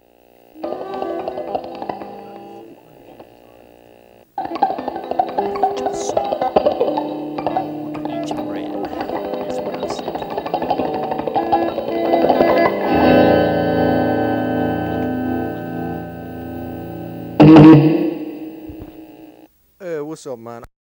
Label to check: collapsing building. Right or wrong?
Wrong